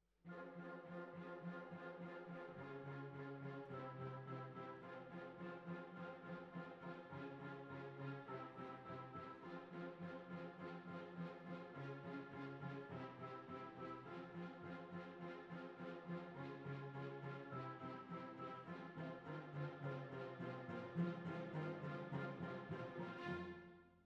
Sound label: Cbasses